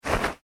clothing shorts polyester shake; Clothing - Shorts ( Polyester ) Being Shaken; Slides; Objects; McKinney Sound

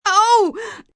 Pain Ooh Gasp, Pain, female; VoiceBox

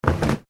towel fall on floor; Towel Or Other Heavy Item Of Clothing / Fabric Hits Floor; Thuds; Objects; McKinneySound

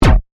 Laser Drop; BlastWave

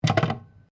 TELEPHONE OLD ROTARY BAKELITE HANGUP

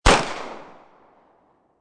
M9 9mm Field, Pistol; M9 9mm Field Fire; VRSonic; Guns

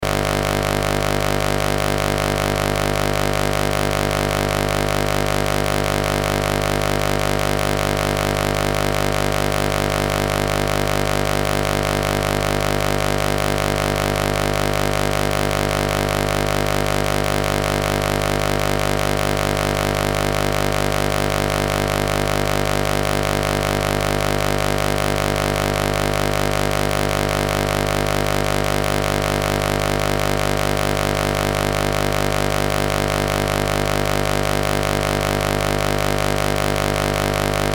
Noise Saw Wave Buzz Hum Electrical Pulse; Noise Saw Wave Buzz Hum Low Electrical Pulse; Electrical Sound Effects

